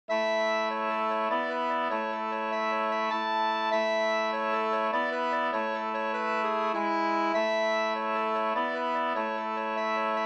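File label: polska, two-part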